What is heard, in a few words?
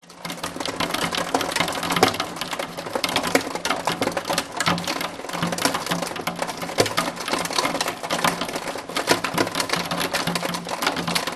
rain hail hit window Germany